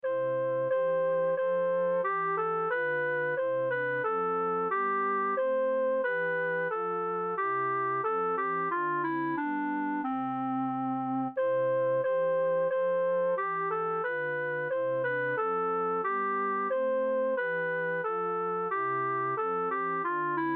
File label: Ein Feste sop; festival choir